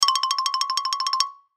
Xylophone Trill; Mallets; Blastwave

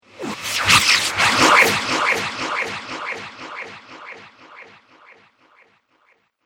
Alien Slurpy Atmospherics

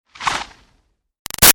Arrow By Ruffles Slow; Bows and Arrows; Sound Effects:Combat; Big Room Sound

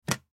Telephone Cordless Pick Up From Cradle Charger; Technology; Telephones; Blastwave

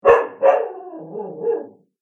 Dog Bark Snarl; animal

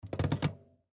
Recliner Chair Recline; Recliner Chair Movement; Household; Chairs; Offices; Blastwave

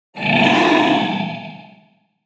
Angry Dragon Monster; Fantasy; Andrew Fleming

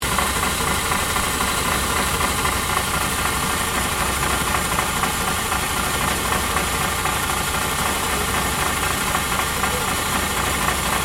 SHIP AIRCONDITIONER LOOP; Machinery; HMAS VAMPIRE

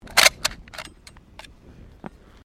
RIFLE 303 MK1 MAGAZINE LOAD; 1942; Rifles, military; Guns

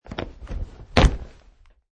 Landing Wood

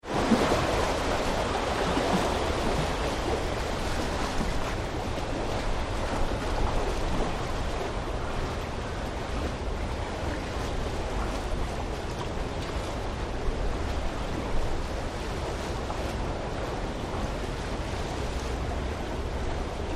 Ocean Sea wash Under Pier Structure; Sea swell onto iron structure; Ocean Sound Effects

